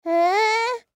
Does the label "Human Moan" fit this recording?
Yes